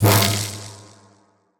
Impact; portal quest android; Dumbledore Skill2

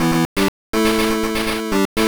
Game Victory; tokusou sentai dekaranger; pico

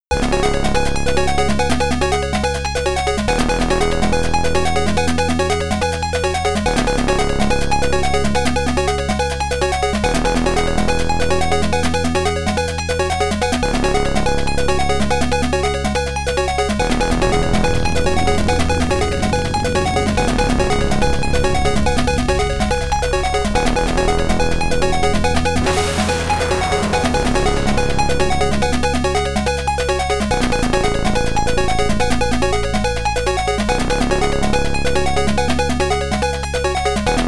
Warp Out; Star Cruiser; PC-9801

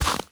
walk snow

